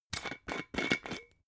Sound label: glass bottle massive open